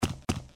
bounce

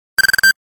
Krrrr Beep; Sony Ericsson; K850i; ringtones

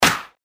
Baseball Normal Hit; LG GD900 Crystal; Game Sound

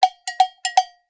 allegro, notifications; ZTE nubia Z20

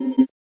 ui basic open, slider tones; Motorola AURA-R1 Celestial Edition

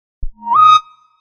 Wind Chime

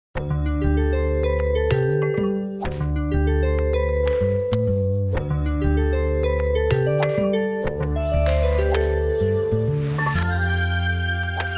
background music; Nokia; Audio themes; Golf

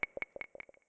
WiFi Connected; Nokia Lumia-1320; Lumia 1320 Southeast Asia

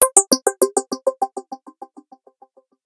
Chimes Synthetic; Motorola Droid-RAZR-HD

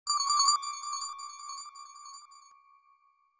sms

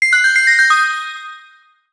Alert; PlusFourSix; Patti; Lars Edvall

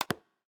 swipe lock, LG Stylo 4 Plus ui

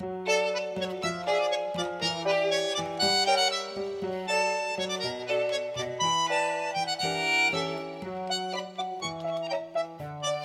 Fiance, ringtones; LG G3 A

